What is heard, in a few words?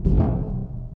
duct, footsteps walk; boomer